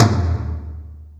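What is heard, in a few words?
Lo Flange, Alesis D4 drum machine tom; 300 Drum Machines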